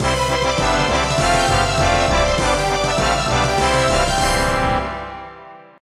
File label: jingle success